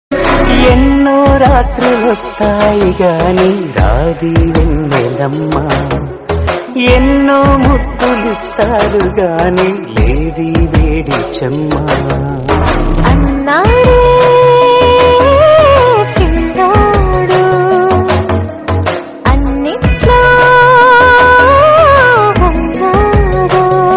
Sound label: remix song telugu ringtone